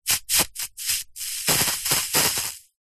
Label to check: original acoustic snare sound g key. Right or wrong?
Wrong